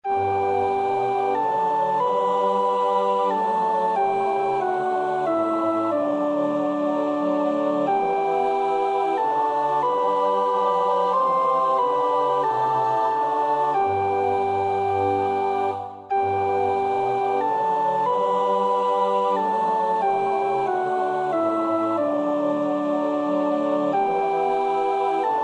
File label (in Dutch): sopraan; Bach (Johann Sebastiaan); BWV 070